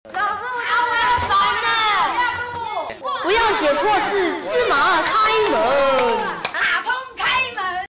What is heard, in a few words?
open door